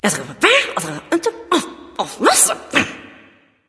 grumbling; smite; voicepack; skadi

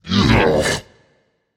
ugh; Smite; cabrakan

